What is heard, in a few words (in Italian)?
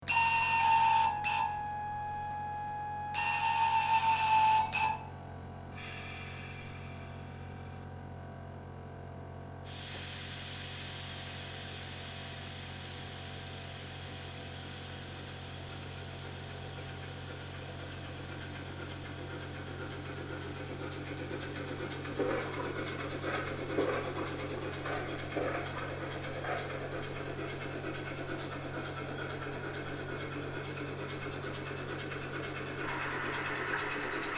Treni in miniatura; in transito; Sede AFC di Chiasso; Sede ATAF di Minusio